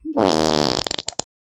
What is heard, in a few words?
a squelchy fart noise